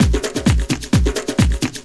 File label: 130 tribal, LOOP PERC TRIBAL; DRUMLOOPS